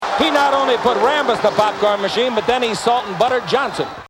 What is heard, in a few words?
Popcorn Machine